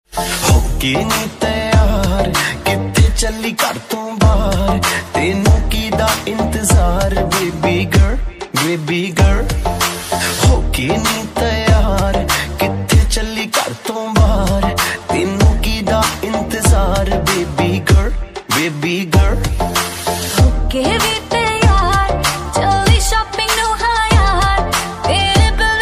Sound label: Punjabi ringtone